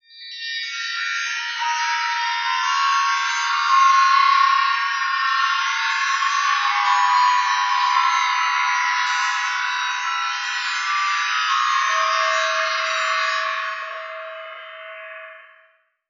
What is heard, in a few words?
Tinkle Field; Zero-G Ambiosis; Light